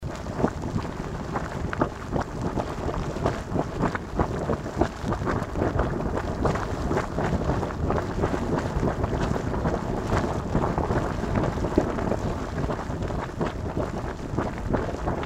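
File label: Geyser Hill Scissor Springs Geyser Binaural, Yellowstone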